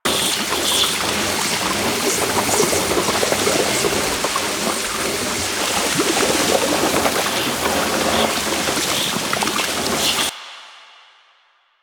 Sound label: Barrel Goop Loop; Effervon; Warframe; Ambience; Nineteen Ninety Nine